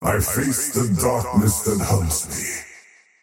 death; dota; faceless void

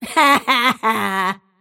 wheel laugh; snapfire

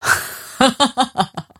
mirana laugh; dota

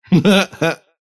wheel laugh; dota; kez

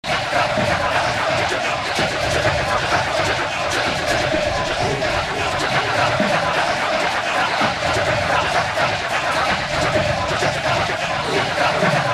fur army; weapons; dota; monkey king

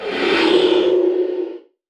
snare cast; weapons; dota; broodmother

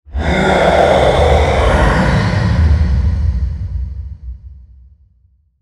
roar layer; weapons; dota; primal beast

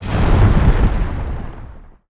magnetize end; weapons; dota; earth spirit

